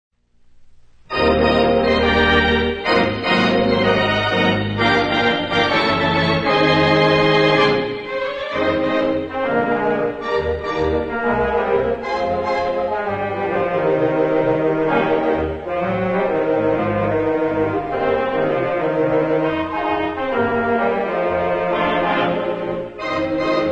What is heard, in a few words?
pop organ, theatre organ; allen